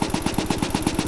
saw engine, circularsaw; weapons

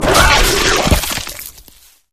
flesh destroyed large; flood

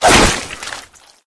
melee swish; flood

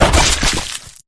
flesh destroyed medium; flood

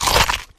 infector bite; flood

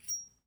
raging bull remove

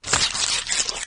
move, flood infection

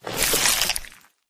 human form long move; flood

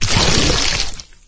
carrier walk; flood

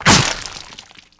splat, explosions

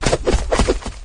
stand pistol; flood; elite

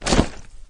jump up, flood

